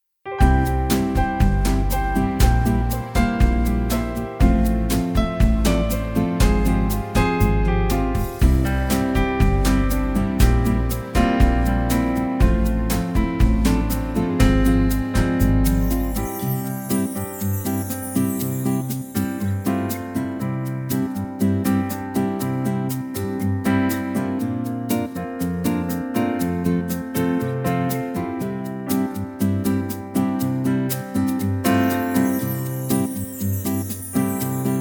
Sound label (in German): BossaNova vollinstrumentiertes Playback C-Dur